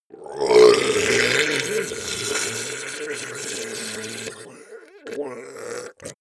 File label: ice golem melt groan slowly; koerpergeraeusche; comedy-cartoon; soundpool